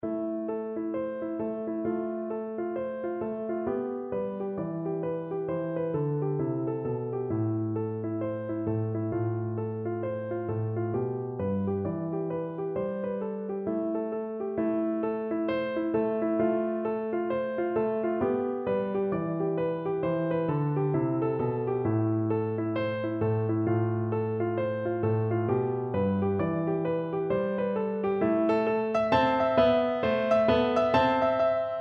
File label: tam lin, piano